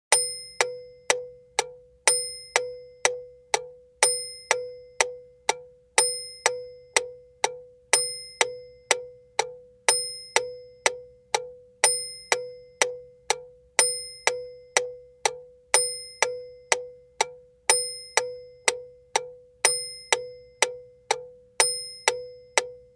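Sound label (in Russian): отстук метронома со звоном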